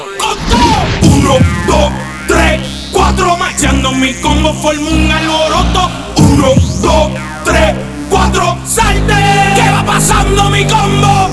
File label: human; zombie plague